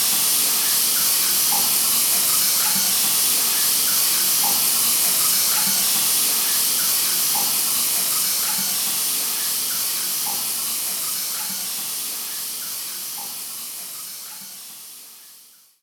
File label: shower